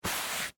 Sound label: Foley, Cloth, Wipe, Hand, Movement, Canvas, Tarp